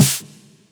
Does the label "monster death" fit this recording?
No